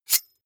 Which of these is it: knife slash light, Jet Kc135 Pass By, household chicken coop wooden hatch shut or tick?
knife slash light